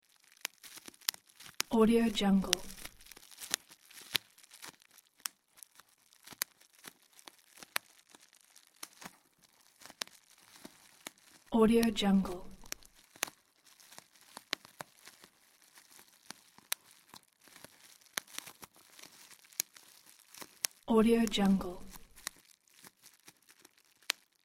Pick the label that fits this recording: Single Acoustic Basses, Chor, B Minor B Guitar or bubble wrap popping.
bubble wrap popping